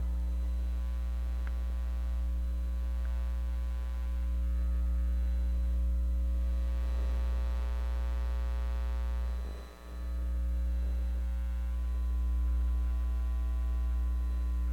NOISE EMF, Hum, Buzz, Noise, Changing, Morphing, LOOP; Articulated Sounds; Electromagnetic Household Fields